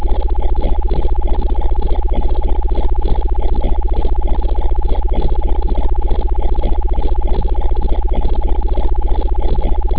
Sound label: Diesel ship